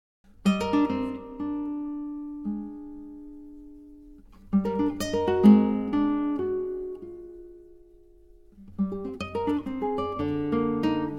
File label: folk song settings prelude